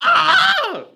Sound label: man injured scream; soundscrate